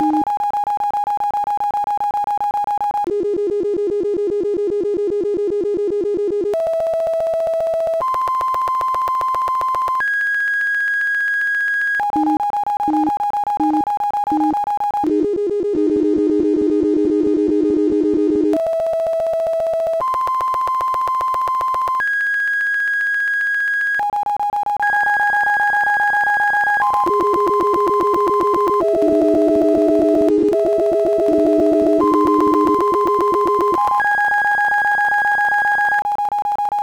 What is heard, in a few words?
sine